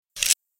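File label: Mechanism Fast Metal, servos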